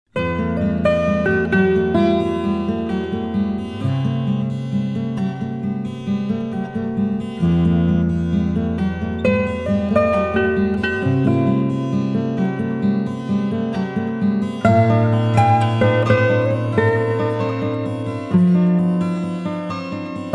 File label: 12 String and Classical